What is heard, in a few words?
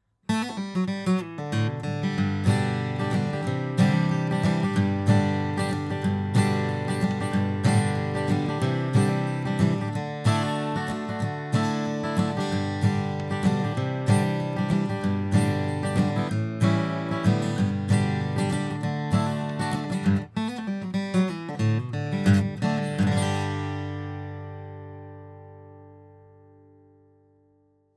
Strumming